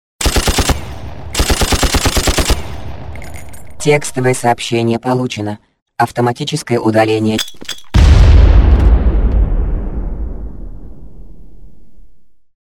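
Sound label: text message received, bursts of machine gun fire, electro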